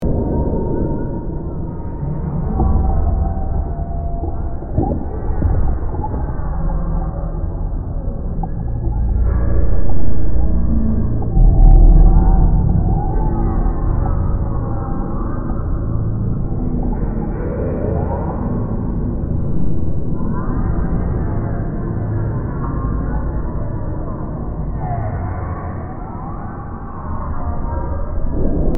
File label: Underwater alien base ambience